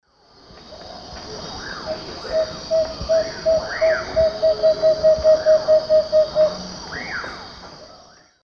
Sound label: Megascops watsonii, alternate song; S. America; Eastern Lowlands